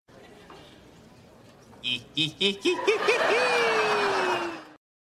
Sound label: laugh